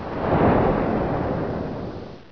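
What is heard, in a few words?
water under, player; q3rally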